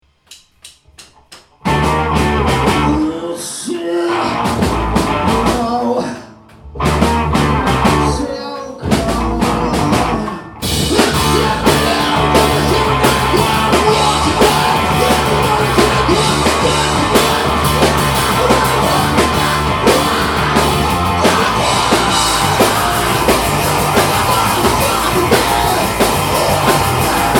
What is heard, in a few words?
ringtone